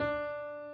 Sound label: key